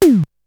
Tom, Drums; ZERO G - Datafile DISC 3

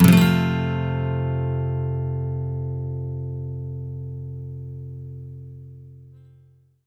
MAJ SWEEP F, Electric & Acoustic Guitar Loops; SWEEPS; USB Soundscan vol.04; AKAI

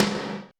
Snr Lo Brutal, Alesis D4

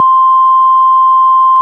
ORG Farfisa Flts; Flt16'; C 6; Keyboards of The 60's and 70's

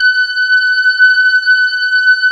G5 SYNTH, SYNTH ORGAN; AKAI S-Series CD-ROM Sound Library VOL-7

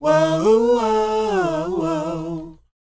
WHOA E, Vocal R&B & Dance; ILIO; Vocal Planet

